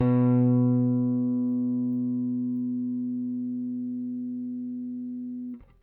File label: RickThumb-B, RickenbackerBass; Airwindows